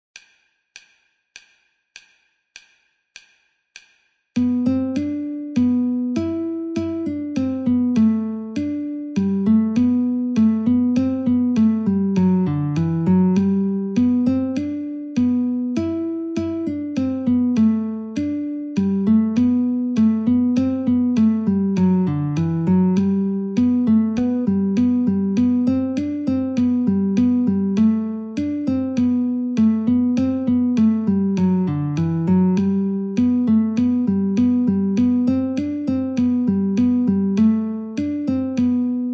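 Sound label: Guitar metronome 50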